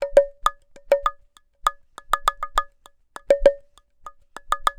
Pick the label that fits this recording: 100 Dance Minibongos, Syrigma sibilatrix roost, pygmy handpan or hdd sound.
100 Dance Minibongos